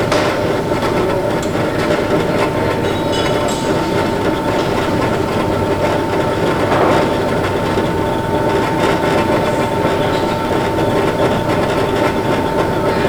conveyor

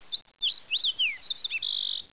bird black

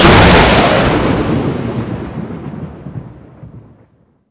gauss

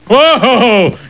woah ho

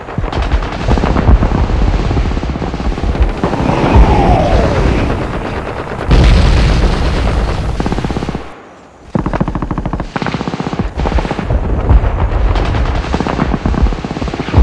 bombing, army beach; dutch

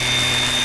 cbar miss; z-lapik ru

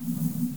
fan loop

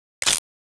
mine deploy

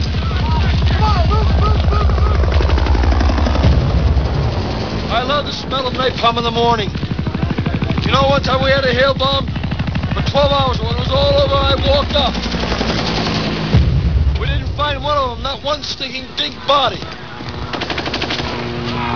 napalm

